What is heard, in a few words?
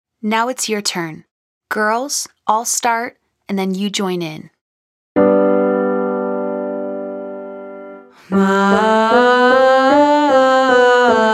Exhale the Mum 5 Note Scale Female Version